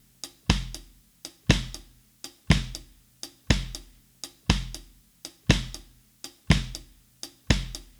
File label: Drums, 70s Analog Funk